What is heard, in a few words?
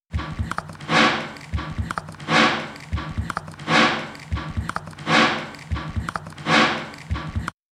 loop; puredata; ALFALOOP